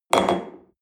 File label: espresso upset table medium, restaurant cafe